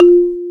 BLFON F3, BALAFON

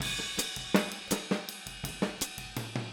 Boogaloo Fill Hi-Hat, 82 BPM, Drums; Sonic Foundry (Sony Creative Software) - Drums from the Big Room The Mixes

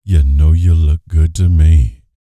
Vocal Planet R&B Dance BASS CATCH; Spectrasonics Vocal Planet CD4

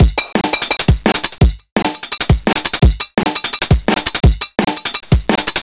Cut Beat, Electronic Beats; KJ Sawka; Drum n Bass & Beyond

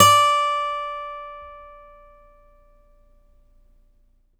STEEL F D4, Synclavier, GUITARS; Synclavier Sampler Library

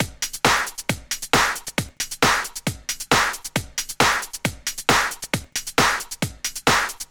135 Hh Loop; Zero-G Trance Inducer; 90 s Sample CDs

